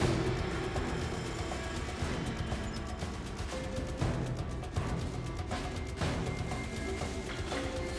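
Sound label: ambience free spin; netent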